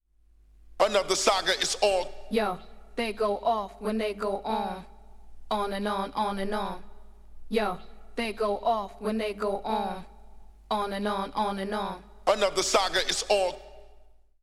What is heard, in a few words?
scratch kit